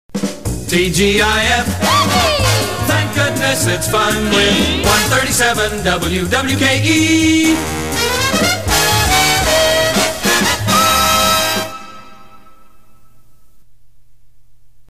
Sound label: jingle TGIF; WWKE